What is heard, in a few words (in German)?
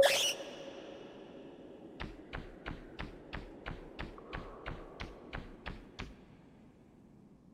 fledermaus; Stardew Valley